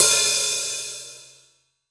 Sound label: PS2002 HH, PS 2002 HH